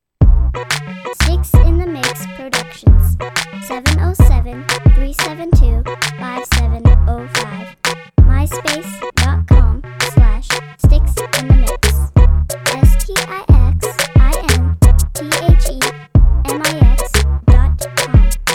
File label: reggae tone